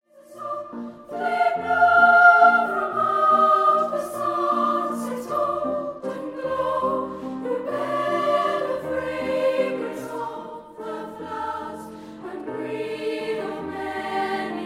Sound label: Childrens Choir Gentle Breezes; Softly Blow